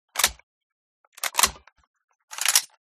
reload; resurviv

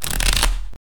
deal, wood

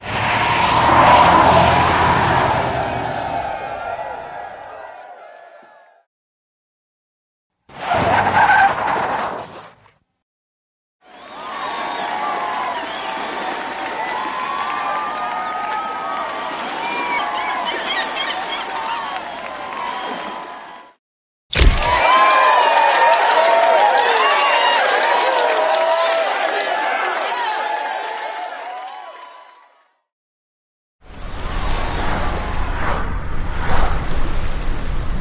seaside

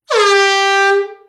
beep

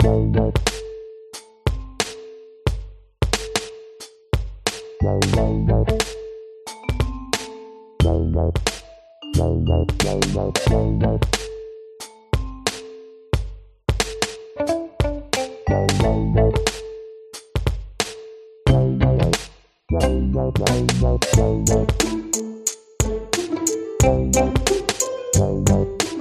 Casual Level LOOP; obby

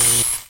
sizzle

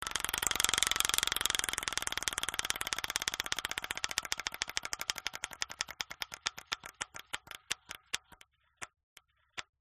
roulette process

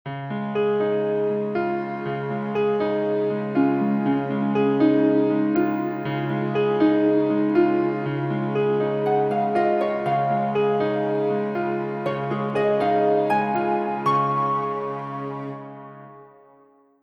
piano

